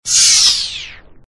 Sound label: slide in sound, ringtone